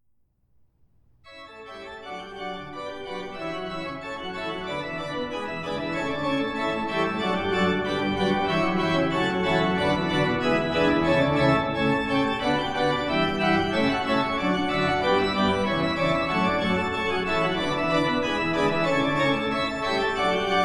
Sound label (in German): praelidium in d-dur; d. butexhude